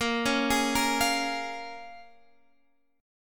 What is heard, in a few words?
A sharp Minor 7th, Guitar, Drop D